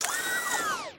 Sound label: rabbit Robot Drop; Rabbids Coding; android